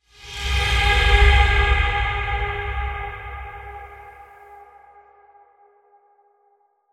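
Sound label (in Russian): таинственный акцент; Короткие звуки для монтажа — для transition, движения, смены кадра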